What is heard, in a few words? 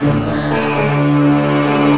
unused sound; Sm3d; bowser